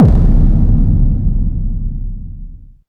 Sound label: Big Break, Korg Electribe EMX-1